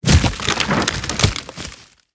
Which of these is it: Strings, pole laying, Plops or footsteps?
pole laying